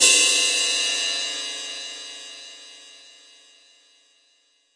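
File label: Yamaha RS7000 hi-hat cymbal G7; drum machine; Yamaha RS7000 Cymbals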